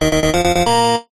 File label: multimedia game tone retro positive complete bright